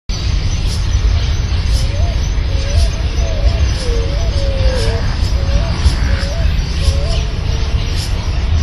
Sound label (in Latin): Columbina picui; RN Otamendi